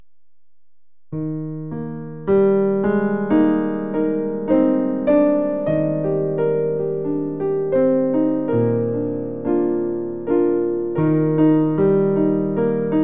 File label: Jesus Shall Reign, Piano